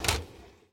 Console Take; Game rip; MiSide

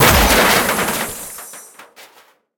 Destroy Bridge; Game rip; MiSide

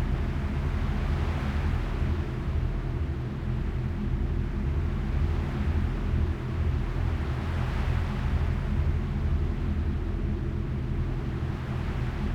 Ambient; MiSide

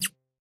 Hammer Drop; Game rip; MiSide

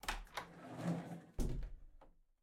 Open Door; MiSide

